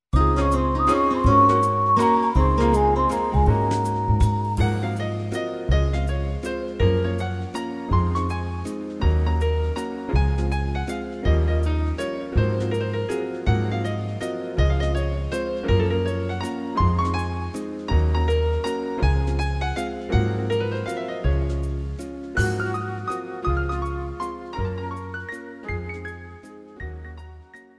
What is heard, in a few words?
Jazz Mazurka